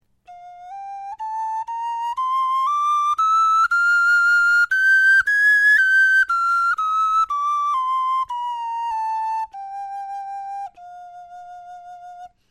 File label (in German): tonleiter